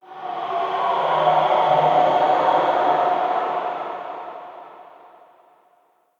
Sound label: Eerie Texture; Ambience; Red Night